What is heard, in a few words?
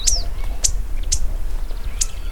Poospiza melanoleuca